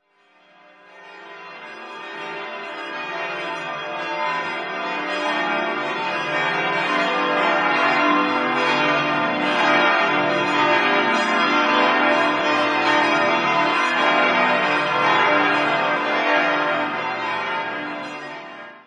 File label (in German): glockengelaeut; innenstadtmartinszug